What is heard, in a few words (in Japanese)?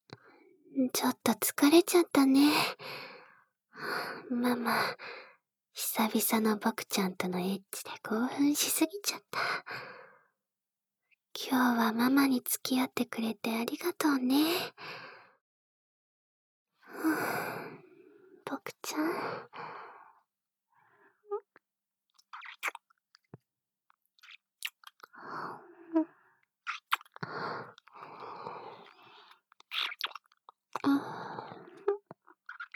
吐息ループ（導入有）